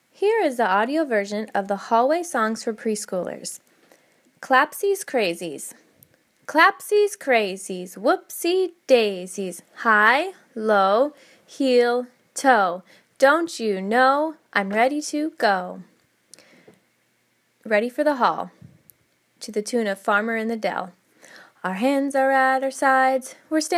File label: Hallway Songs